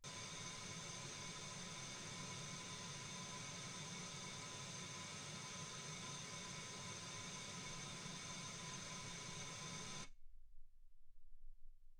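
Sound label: white gaussian noise 48kHz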